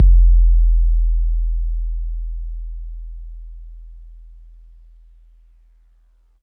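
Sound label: bass drum 808 MPC60 Nice E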